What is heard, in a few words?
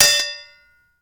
hit metal metal; Mount&Blade Warband